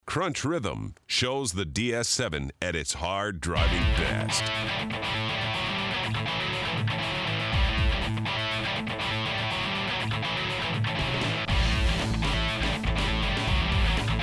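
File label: Crunch Rhythm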